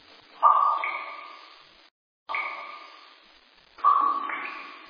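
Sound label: cave drops in space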